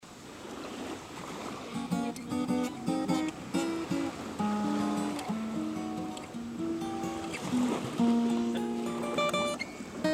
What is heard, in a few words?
guitar; crimea